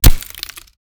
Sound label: Windshield Hit; Adobe; after effect; Action Essentials 2; Bonus Sound FX